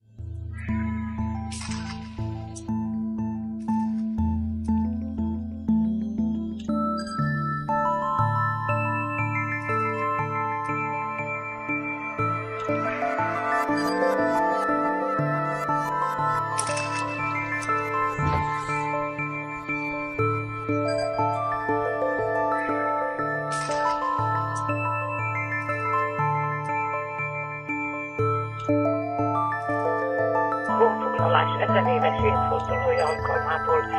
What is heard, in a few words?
Waterbells